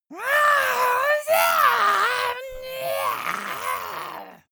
monster scream high

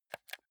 console controller r1 press

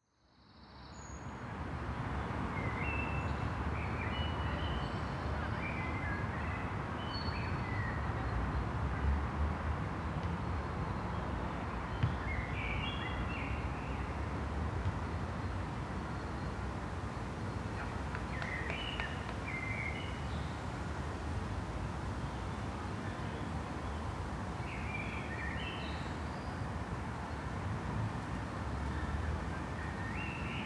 park birds people traffic wind

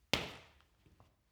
punching bag kick soft

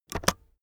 keyboard external space press fast

